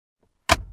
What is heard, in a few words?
Door Close; car simulator 2